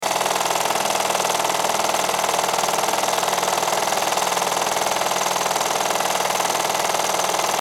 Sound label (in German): Projektor